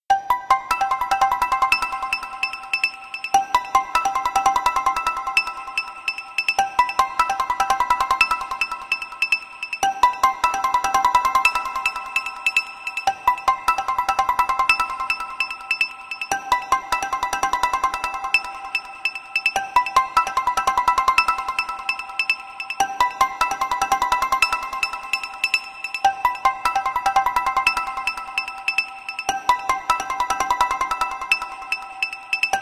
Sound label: Ice Sound, alarms